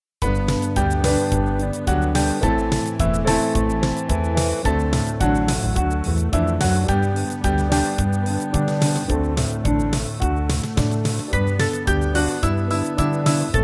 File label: Band; Argentinia